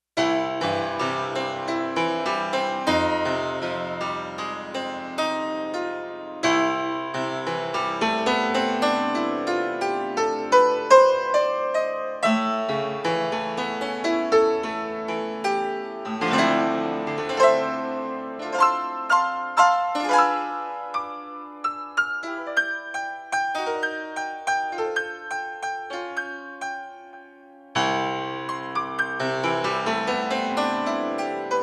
Bosendorfer

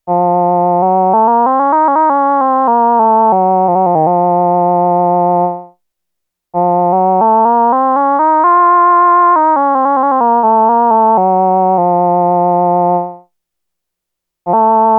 OBOE, Roland SH-101; Factory Patches

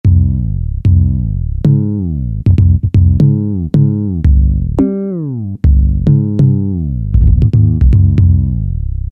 Alesis QS8, Dance Glide; Factory Preset examples

